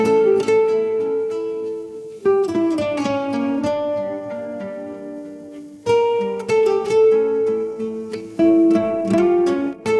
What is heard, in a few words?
bach guitar